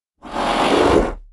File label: shadow attack; Paradise; shadowdemon